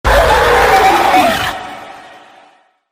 cartoon cat jumpscare sound

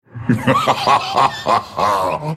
alarajajajajaja

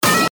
pipe pitch

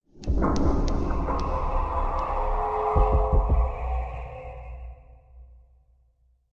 minecraft cave sound

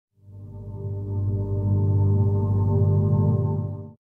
bird screams

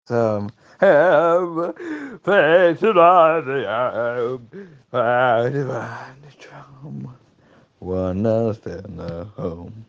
nice guy singing

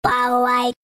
beep

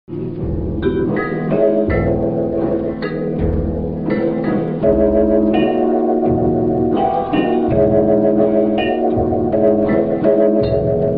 japanese tv synth

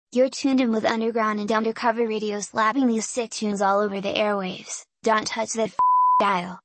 sweep

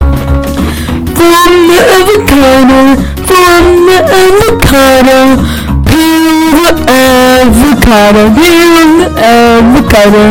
my best singing abilities

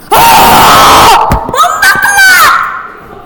scream

